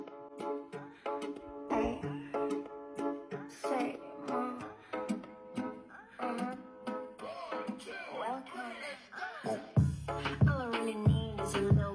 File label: Kazakh hip hop remix